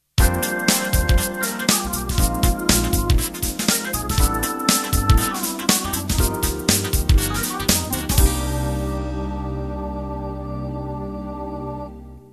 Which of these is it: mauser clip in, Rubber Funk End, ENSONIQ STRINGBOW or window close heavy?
Rubber Funk End